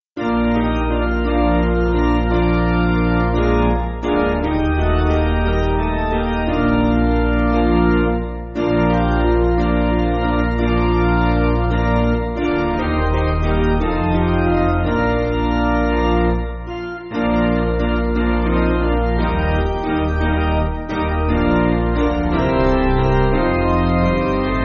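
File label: Organ Piano; Kirkpatrick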